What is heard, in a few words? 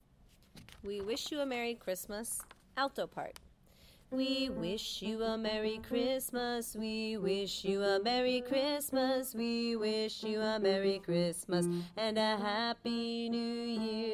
We Wish Alto